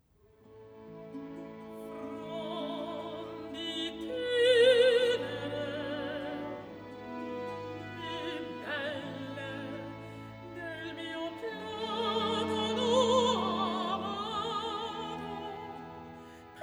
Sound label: Recitativo accompagnato; Frondi tenere; Händel; Serse, HWV 40; Act 1